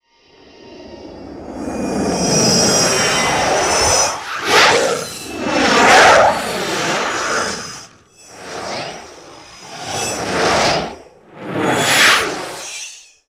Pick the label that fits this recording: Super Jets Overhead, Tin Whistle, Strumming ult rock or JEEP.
Super Jets Overhead